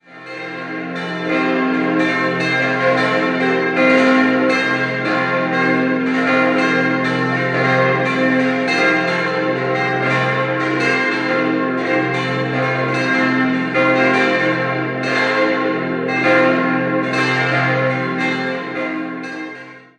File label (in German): Wuppenau, Pfarrkirche, glocken; Wuppenau TG, Pfarrkirche St. Martin